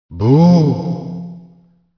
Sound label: boo